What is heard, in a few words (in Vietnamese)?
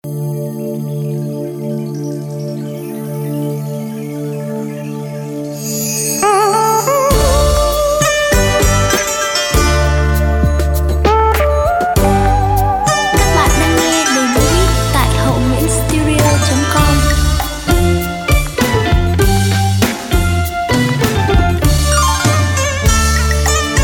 Dua do beat